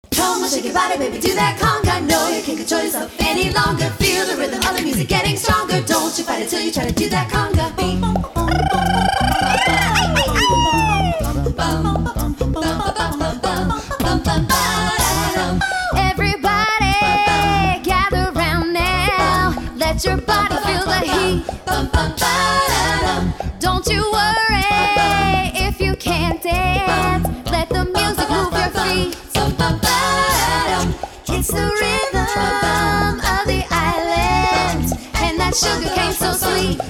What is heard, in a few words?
Conga